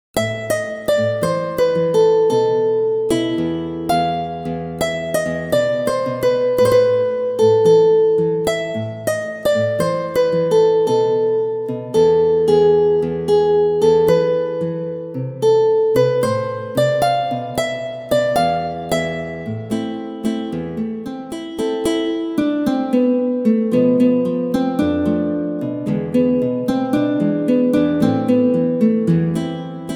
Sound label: synth